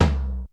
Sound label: XE8 TOM; Crypton - DrumBase5000